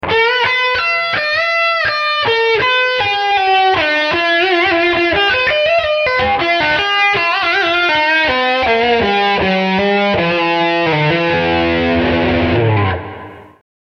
Solo Lick Rear Humbucker

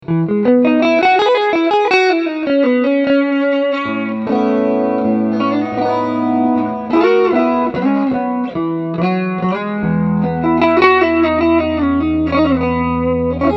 Joyo GEM BOX III DTune Clean

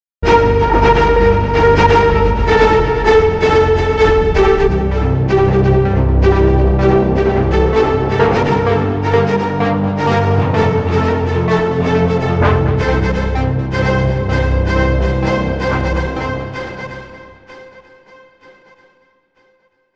Cello Chopper RHY

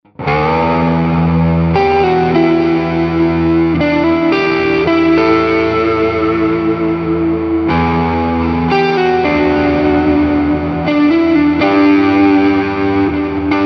Audified ampLion 2 Delay Reverb; Bartender

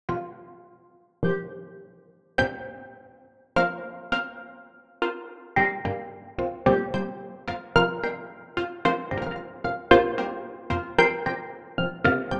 Sensory Percussion Harmonic; Sunhouse

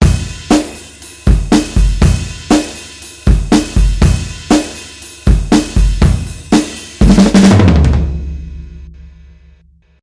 Drums Classic Clipper

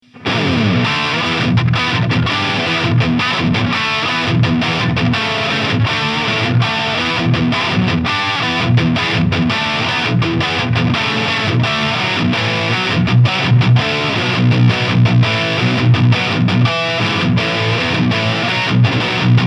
Chapman Guitars ML1 Modern Baritone Lead

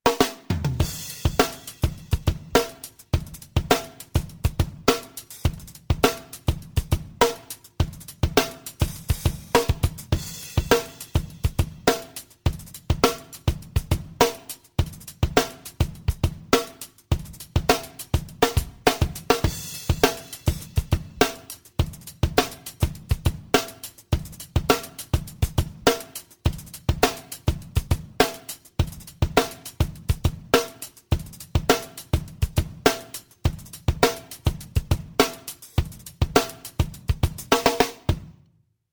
Loop

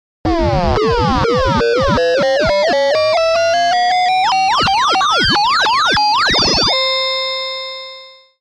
Oberheim OB-X X MOD; GForce

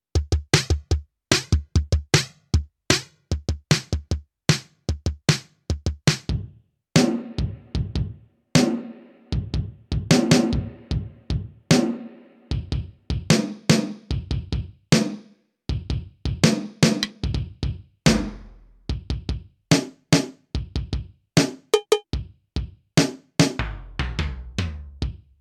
Drumrooms

